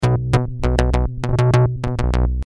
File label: ringtone, doepfer a100